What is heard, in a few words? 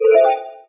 drink bubble; There; ThereClient